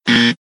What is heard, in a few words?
Wrong answer sound effect; cardMatching; 21CC Navigator Gem Matching Game